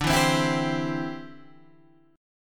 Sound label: D 7th Suspended 2nd Suspended 4th D